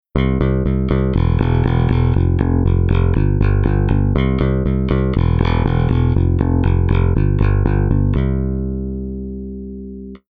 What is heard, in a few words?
Bass Pickup Rear